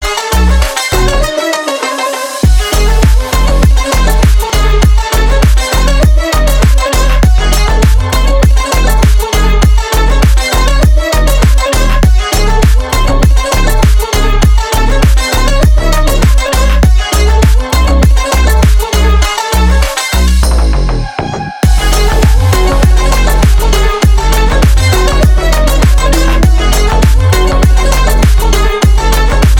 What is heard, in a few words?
mandolin